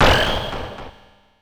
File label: Smash Hit; Super Smash Bros